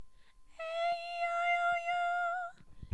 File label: aeiou High; A E I O U Sung in the high range